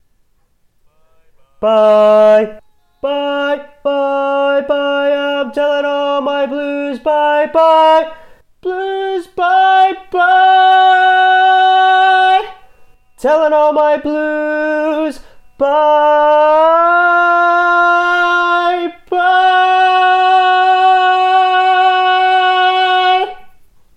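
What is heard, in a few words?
Baritone, barbershop tags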